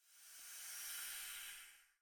BUS HYDRAULIC DOOR; SIMUZENSOUND

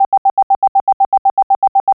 PARK SENSOR; SIMUZENSOUND

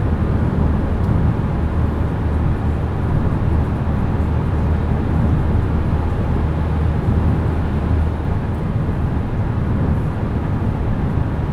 BUS INTERIOR HIGH VELOCITY; SIMUZENSOUND

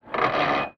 GEAR GRIND; SIMUZENSOUND

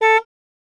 Horn, YBR125

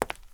FOOTSTEP CONCRETE; SIMUZENSOUND

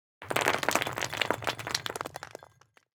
COLLISION CONCRETE; SIMUZENSOUND

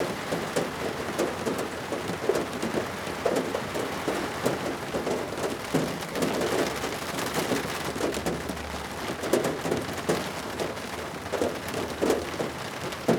HAIL MID OUT

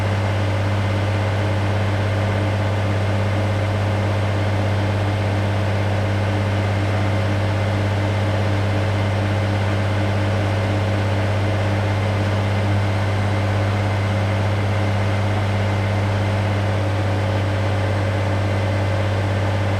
SPEED LOW; SIMUZENSOUND

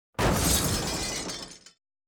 COLLISION CRISTAL; SIMUZENSOUND

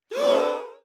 CROWD SHOCK; SIMUZENSOUND

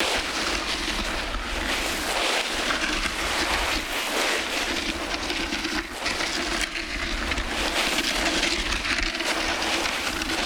SKID EARTH; SIMUZENSOUND

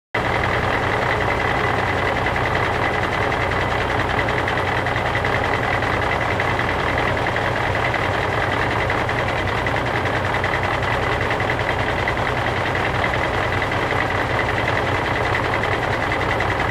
PRE MOTOR; SIMUZENSOUND

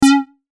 Error Notification; Minesim; Simescar

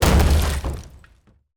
COLLISION BASS; SIMUZENSOUND; Wood Skid Earth

